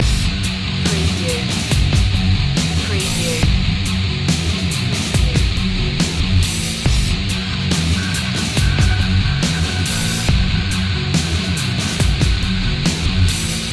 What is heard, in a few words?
anvil loop